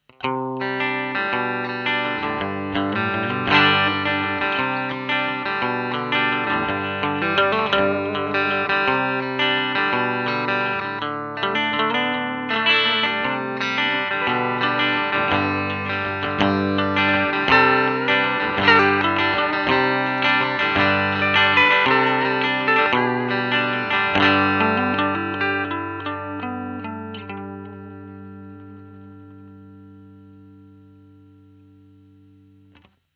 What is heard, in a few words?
strat rockwood clean